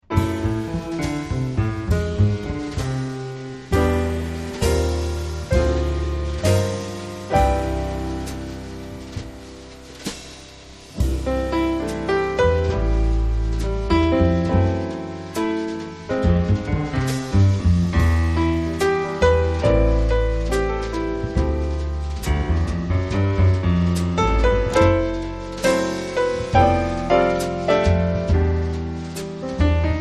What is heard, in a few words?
samba jazz trio